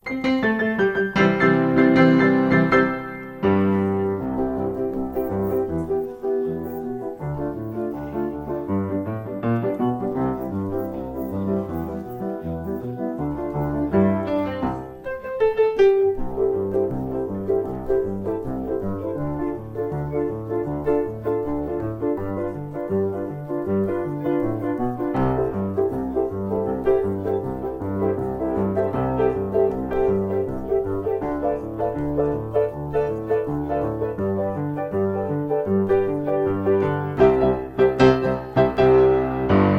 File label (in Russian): bulya bulya minus